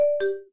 Video Stop; Vivo T1 Pro 5G; ui

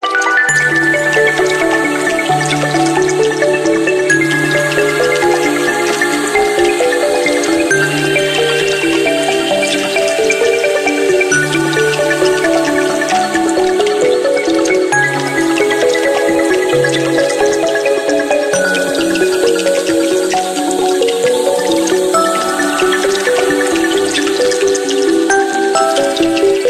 Underwater world, ringtones; Samsung SCH-W789-Hennessy

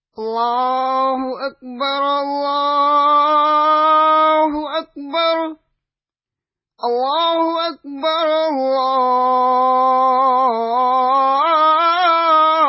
azan; Samsung GT-E2130-India

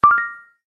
Voice stop, ui; Samsung; Galaxy Note 2

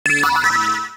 Notifier Star Portal; BlackBerry; OS-4.6

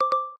beep twice; Tecno Pop 9 4G; ui

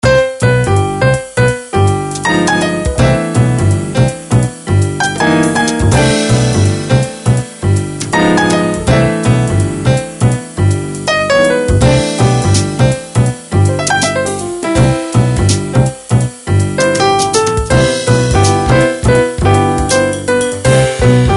Jazz, phonetones; Swisscom

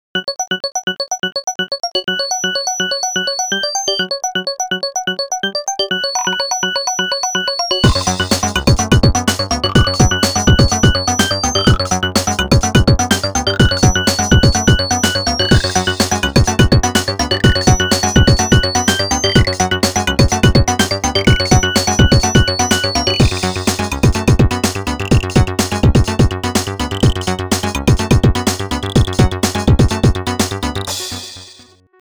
action; Nokia; Markus Castrén; Pursue

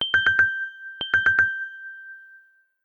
Glassy Tone, ringtones; Samsung; Gear S3 Frontier

